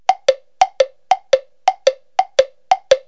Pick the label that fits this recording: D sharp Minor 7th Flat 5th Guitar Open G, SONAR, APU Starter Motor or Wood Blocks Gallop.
Wood Blocks Gallop